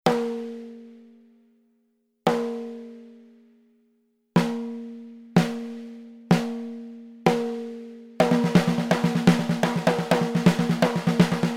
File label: Snare Mapex Rimshots; Axis